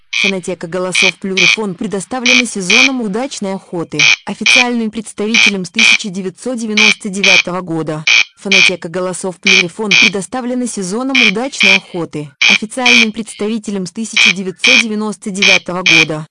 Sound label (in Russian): коростель; plurifon